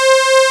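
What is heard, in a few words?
Moa Aggressive Vocal Agree; FUNK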